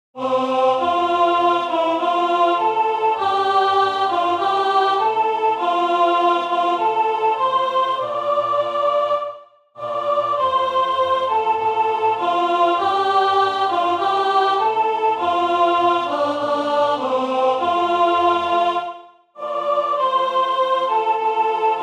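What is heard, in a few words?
Auld Soprano; England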